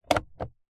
vehicles suv centre console compartment plastic lid nissan patrol 2019